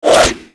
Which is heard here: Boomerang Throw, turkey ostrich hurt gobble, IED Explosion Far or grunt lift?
Boomerang Throw